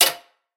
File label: Laundry Machine Switch Off; Prison Architect